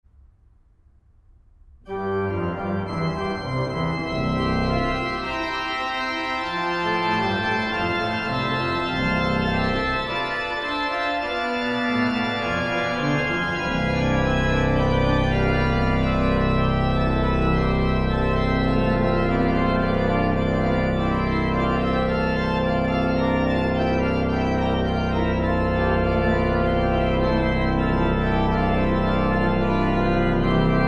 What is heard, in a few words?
Praeludium in C